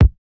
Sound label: Electro kick; Reference Beat